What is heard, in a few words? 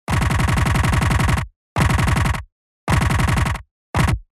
Assault rifle